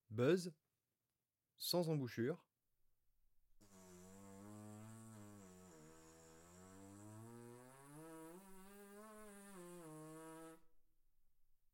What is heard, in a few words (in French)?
buzz sans embouchure, trombone; Textures; Sons complexes faibles; traite orchestration